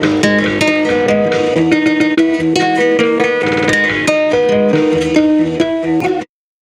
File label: guitar